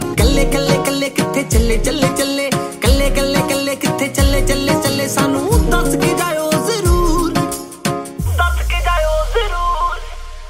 Punjabi Song Ringtone

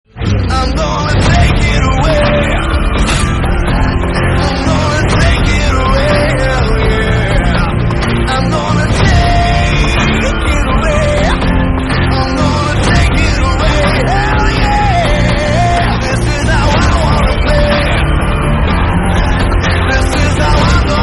Tamil Song Ringtone; Leo

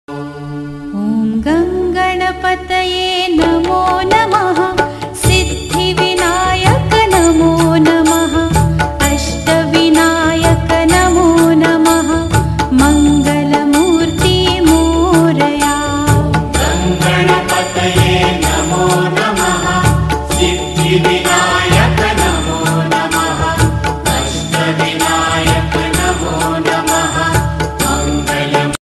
Om Gan Ganpataye Namo Namah Female Ringtone; ringtones

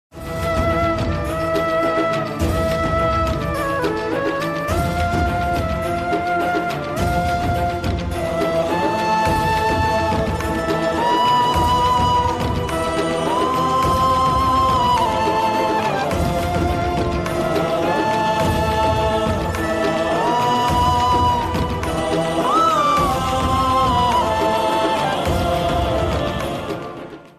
Sree Krishna Flute Ringtone

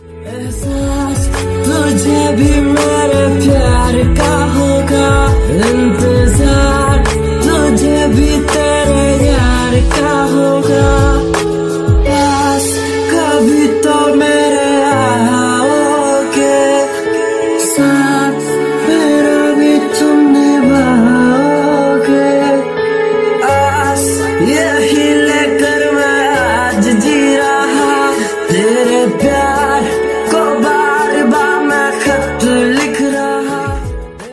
Sad Song Ringtone

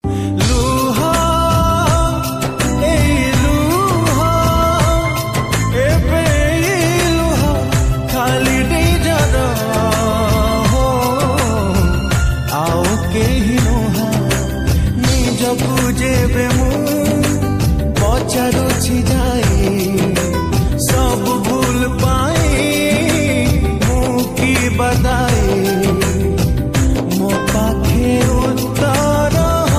Odia Sad Song Ringtone

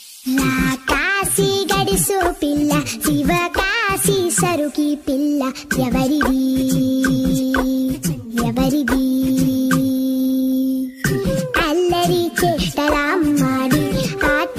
Tamil Song Ringtone

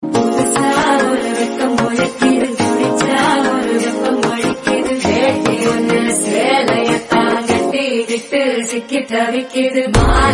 Tamil Song Ringtone; ringtones

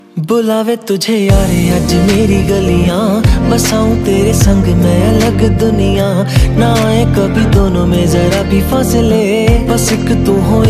Bollywood Movie Song Ringtone; Luka Chuppi

